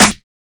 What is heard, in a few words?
short reverb acoustic snare e key, steel snare drum sound tuned to the E note